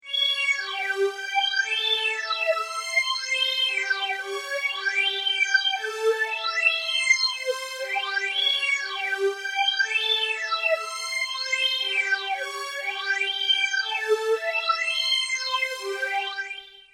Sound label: strings, stage phaser; x5dr